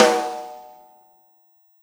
Snare Shard; Sonic Foundry (Sony Creative Software) - Processed Drumkits Zero Gravity Beats; Shards

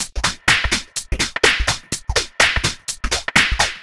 125 No Bd, Drum Loops 125bpm; Zero-G; Soundclash Nu Dance Electro; Acidized Wavs; Tough Jam

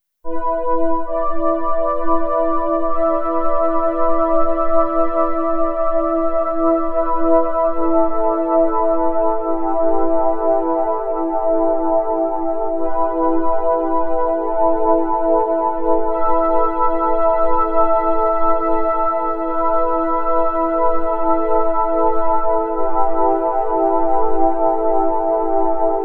Light Paddeis; Electro Lounge; Sonic Foundry (Sony Creative Software); 072 Green Street

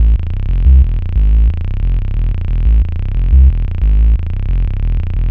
Pure Analog, Keyboards; Sonic Foundry (Sony Creative Software); Leo Cavallo Bass Taster

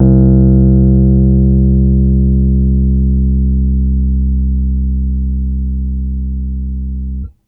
Piano Rhodes c1 med; TrashLibrary

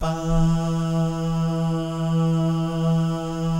BAH GUY, Jazz FX; Spectrasonics; Vocal Planet; JAZZCOMBO

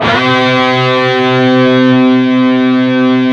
PRS, Guitars, PWR SLID

